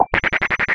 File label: Ratchet Wrench; Convolution; Special Effects; IR Samples; Kontakt 5